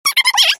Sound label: clock